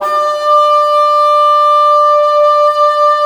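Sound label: wind bassoon; LA Composer vol. 3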